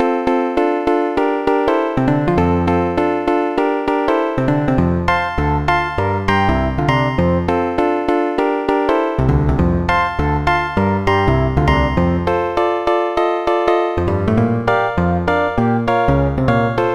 harmony song, MIDI; The Music Studio

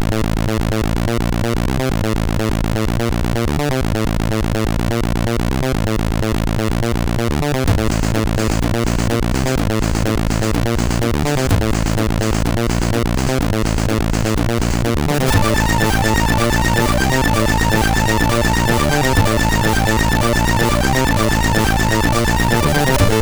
Techno sid MOS6581R4

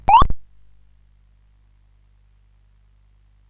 sid CSG8580R5; Congo Bongo version 2

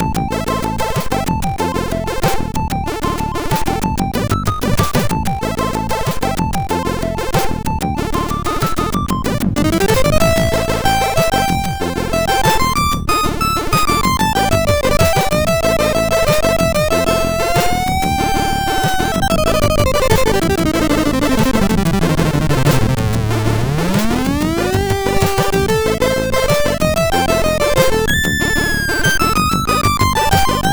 tune, MOS6581R4; sid

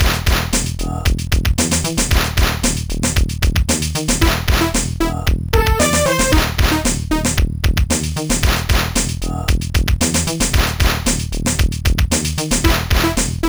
slow house; Sonix Music Driver

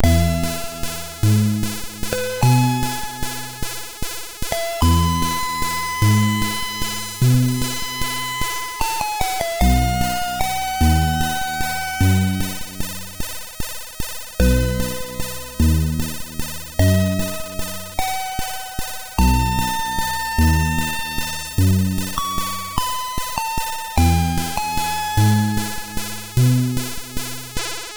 Something Classical, MOS6581R4

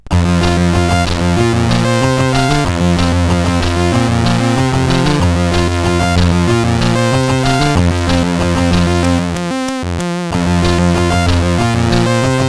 Beat Box CSG8580R5; sid

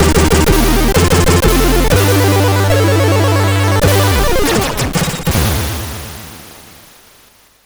intro, CSG8580R5

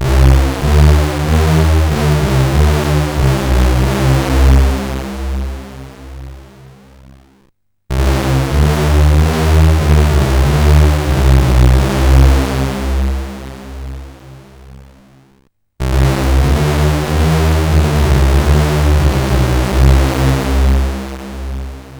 sid CSG8580R5; Omidar

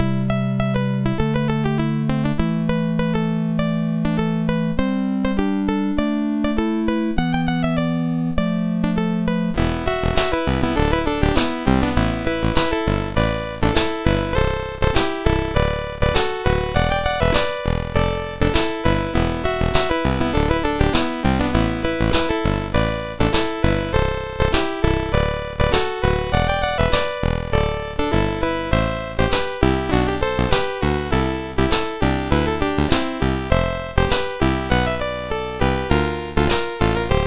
Music Box, sid MOS6581R2; Randall; Eight C64 Games in Six Minutes

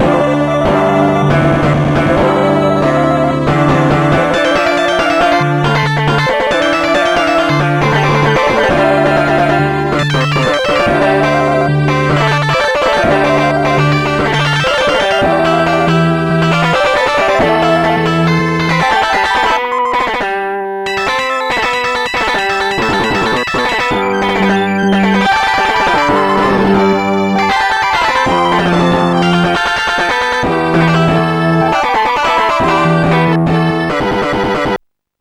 avant rock; Instant Music

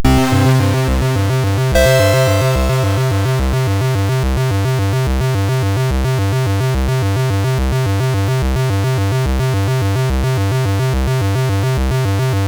sid MOS6581R4; Tiger Mission

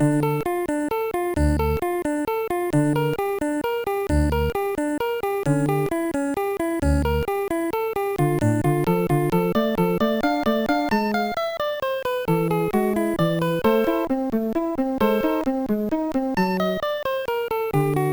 Bach Prelude; Sequencer One

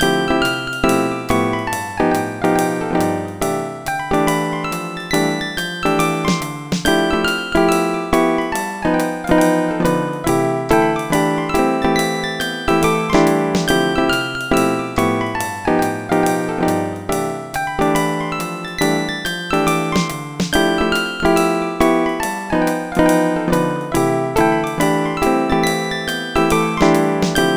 solo; Dr. T's MIDI Recording Studio

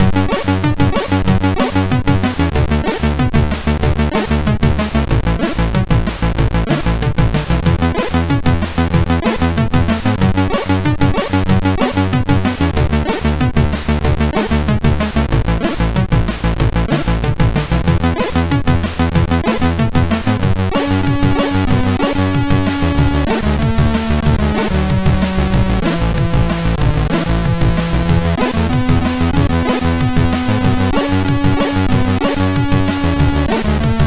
tune, sid MOS6581R2

